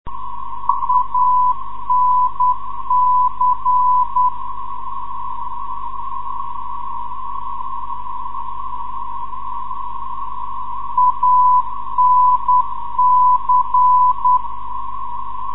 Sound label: anc 374.5khz, ndb